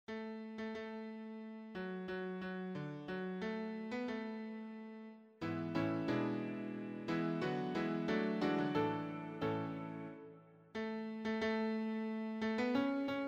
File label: piano